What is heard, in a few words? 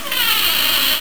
door; Castle of Xhentars